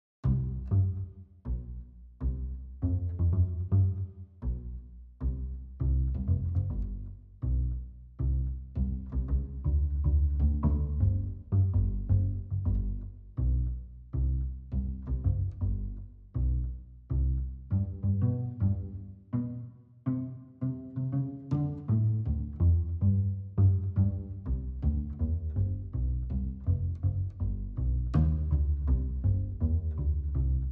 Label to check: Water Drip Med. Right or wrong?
Wrong